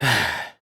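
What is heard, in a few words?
sigh, male; rantaro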